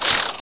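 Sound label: scorpion flinch; svencoop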